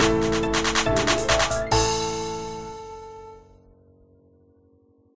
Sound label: completion; Gauntlet